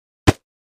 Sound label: Body Punch; punches kicks hits bones